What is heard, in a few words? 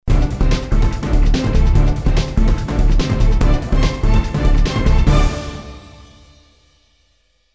Dramatic Stinger